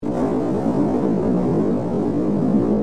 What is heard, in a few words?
flight sound